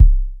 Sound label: BD, Drums Dance & Groove